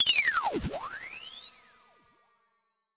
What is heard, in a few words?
sound design effect strange ring; sound effects one